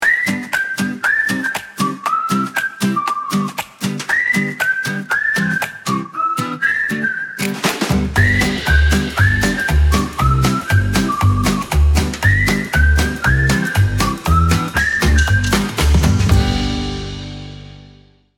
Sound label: music